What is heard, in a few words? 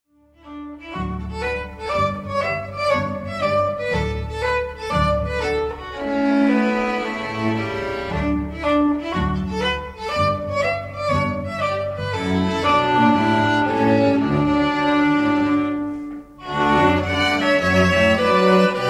Humoresque, string quartet; Dvořák